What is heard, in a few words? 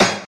basic Bet Level; mega joker